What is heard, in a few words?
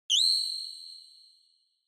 Onoma Flash Dry; オノマトペ 光る; ドライ